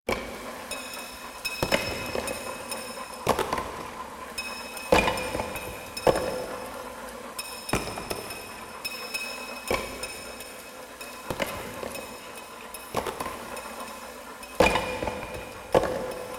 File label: mine; Seer; GamigoNA